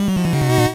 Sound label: 8bit se